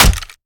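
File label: melee character gun medium steel; viper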